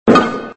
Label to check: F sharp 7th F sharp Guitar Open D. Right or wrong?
Wrong